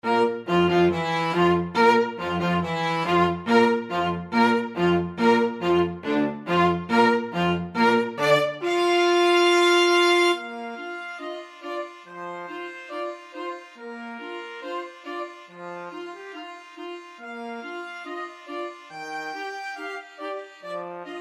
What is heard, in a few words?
march, flexible mixed ensemble 4 players